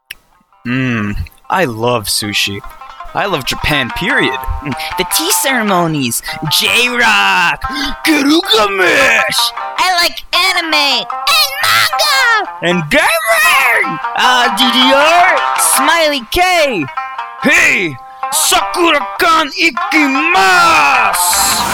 dubstep mix

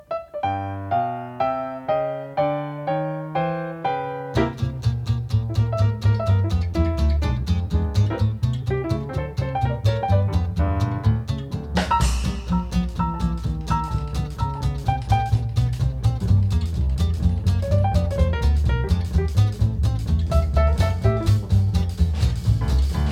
Gentle Forest Jazz

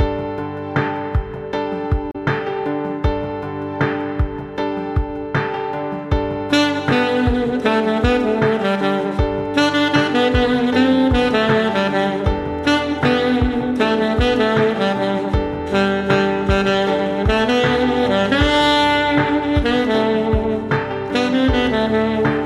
Alto Saxophone Cover

